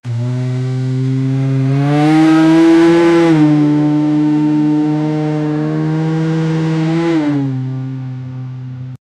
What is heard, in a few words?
ev supercar rev, electric vehicle